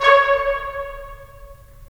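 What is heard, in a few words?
brass trumpets staccato, left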